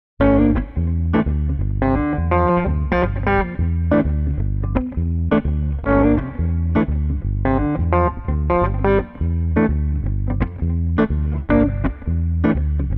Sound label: Street Guitar Bass Smooth Funk 85